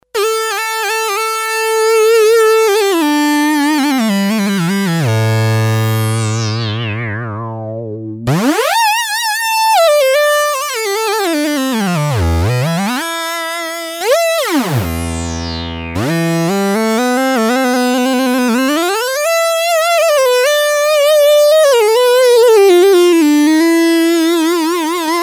Sustained lead sound unison, Sequential Circuits Six-Trak; Factory Programs